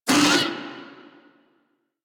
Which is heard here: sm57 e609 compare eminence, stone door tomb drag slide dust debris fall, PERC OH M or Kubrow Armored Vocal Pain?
Kubrow Armored Vocal Pain